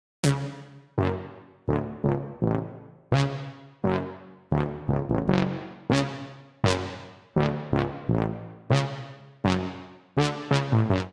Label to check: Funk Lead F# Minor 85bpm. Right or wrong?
Right